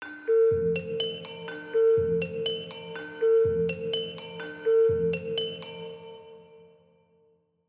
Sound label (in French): canon rythmique dual